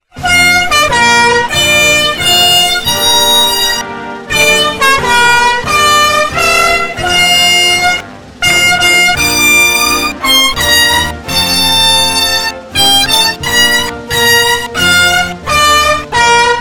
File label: car horn